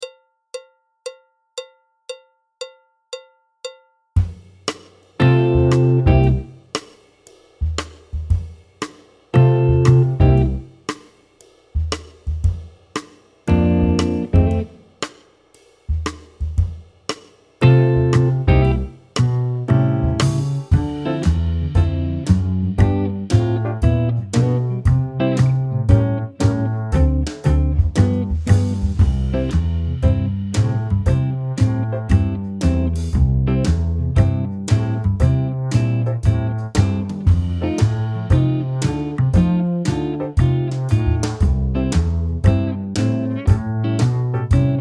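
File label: backing track, Jazz; clarinet, flute; Jazz Syllabus Level 2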